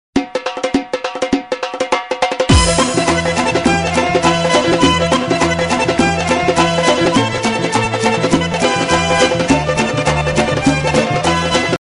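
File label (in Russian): кавказские барабаны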